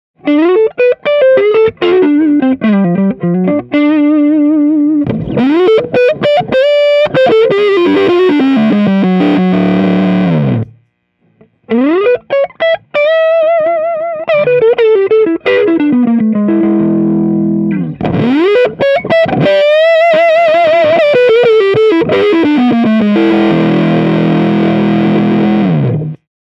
strat neck, pedals; gboy

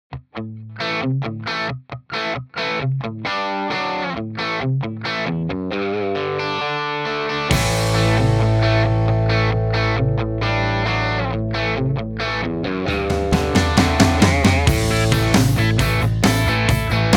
Coyote song; mojave

